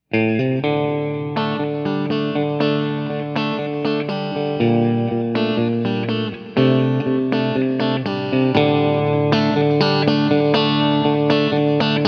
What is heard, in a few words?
classic phase